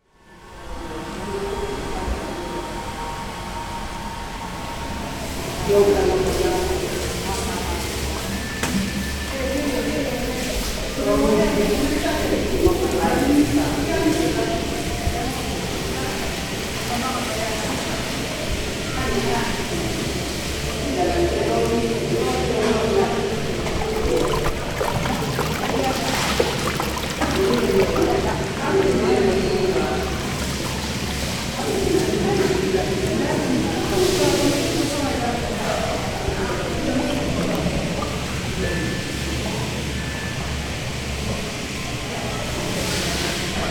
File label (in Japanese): minna no ofuro; みんなのお風呂２; Chino Cultural Complex; 茅野市民館